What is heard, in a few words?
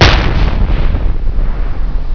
BOOOM